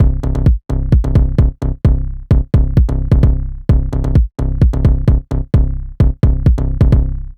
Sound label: bass and drum loop